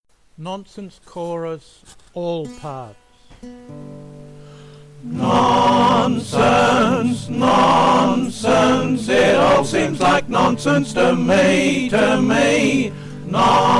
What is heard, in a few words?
nonsense chorus all parts